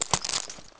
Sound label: glass step; player; steps; Soldier Of Fortune II Double Helix; XBOX